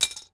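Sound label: metal drop Concrete small; objects; Star Wars Obi-Wan; XBOX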